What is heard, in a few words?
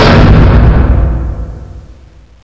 stomp; GoldSrc